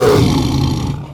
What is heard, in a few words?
death dragonspawn overseer, creep deaths; dota